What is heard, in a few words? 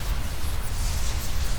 focusing